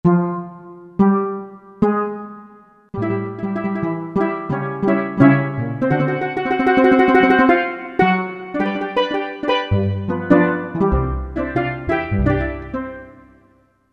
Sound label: Carribean, Korg MSC-10S Ethnic